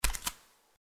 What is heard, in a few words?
Footsteps Barefoot One